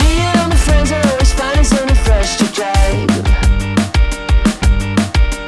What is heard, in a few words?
Rock Master